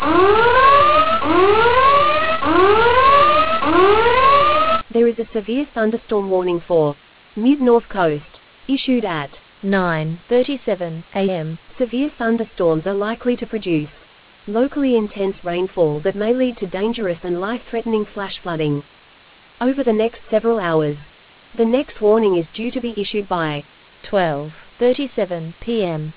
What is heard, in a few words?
storm audio